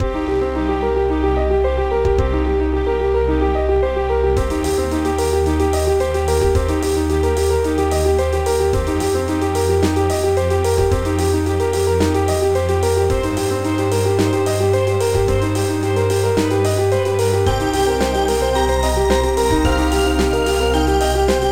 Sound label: Electrowave; jet impulse